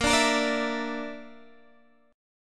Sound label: Bb Major Flat 5th, Guitar, Half Step Down